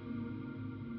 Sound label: Chord, Experimental; Ableton; Convolution Reverb